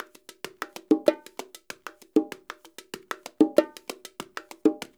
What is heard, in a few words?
PERCUSSION LOOPS; Sampleheads; NYC Percussionworks; 90 s Sample CDs